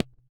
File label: THROAT; Spectrasonics; Metamorphosis; THROATERS